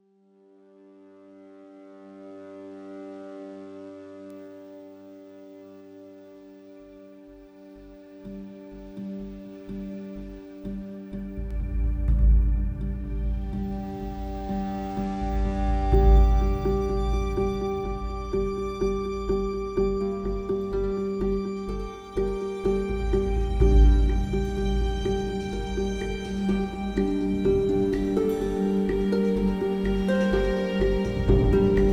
grandharp

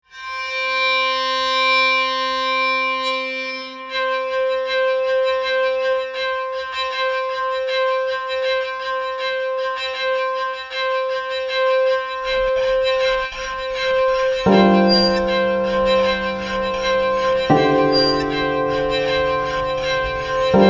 psaltery

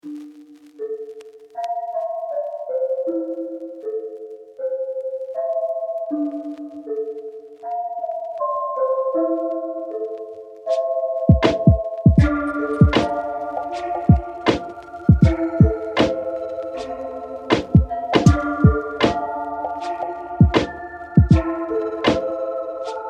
java mallets lofi hop

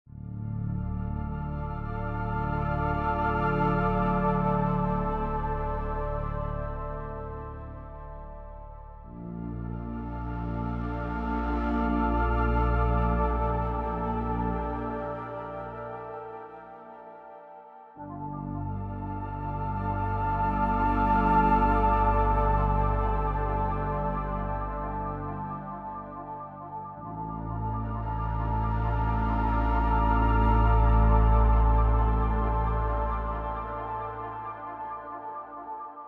vertigo wind

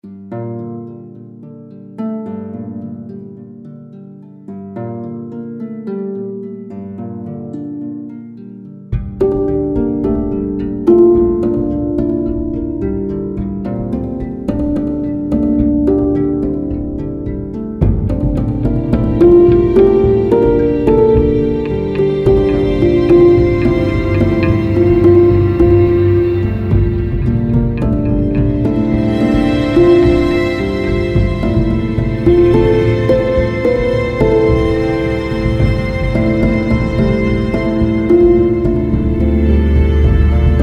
grand harp

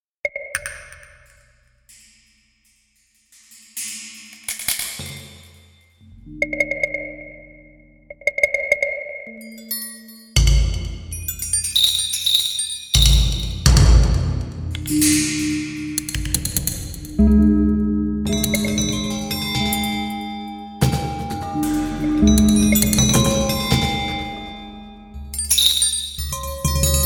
percussion interlude percussion ensemble

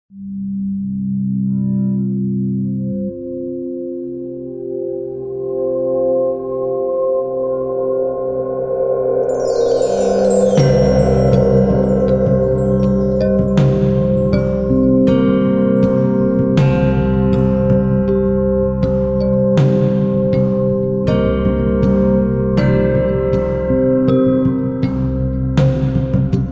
glass wineglass

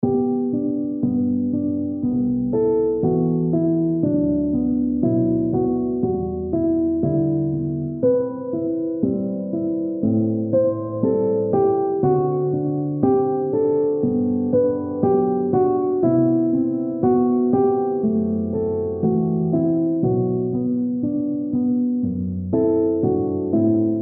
studiopianos pavane

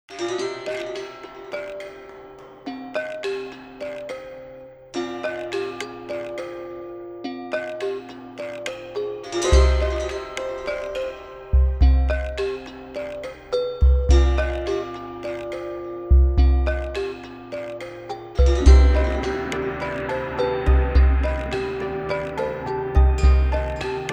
glass pattern

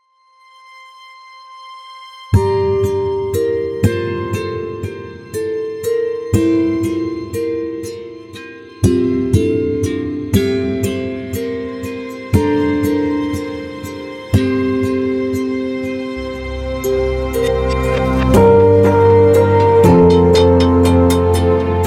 lap harp

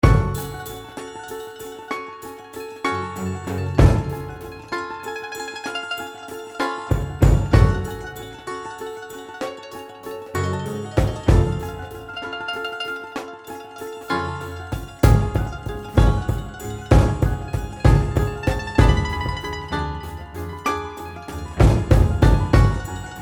balalaika fake waltz

